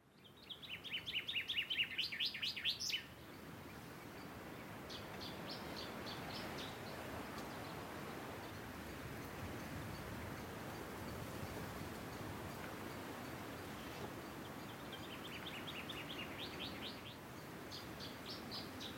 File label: Forest; cinematics; Age of Empires III - Complete Collection; Microsoft Studios